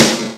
Snaredrum, Yamaha TG-33; Drum Samples

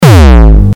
HardCore Kick

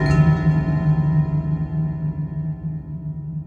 Ambient Strum; Sound FX

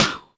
snaredrum, Yamaha RM 50

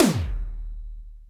Yamaha RM 50 Toms

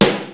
Snaredrum, Roland TR-626; Drum Samples

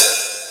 Hat Open, Yamaha TG-33; Drum Samples

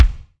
Kick Dry Flabby

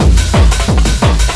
old skool hardcore, audio loops

